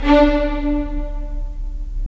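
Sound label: strings violins, right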